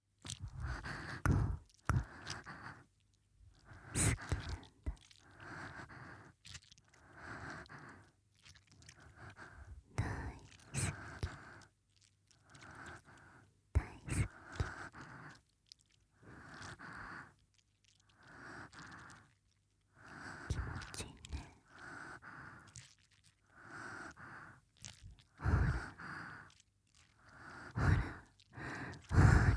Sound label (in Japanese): 乳首舐めループ音（高速ちゅぱちゅぱ）